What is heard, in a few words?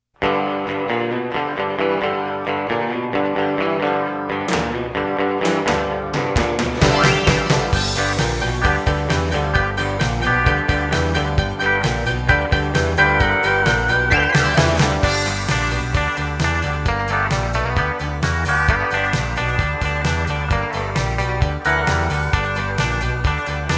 music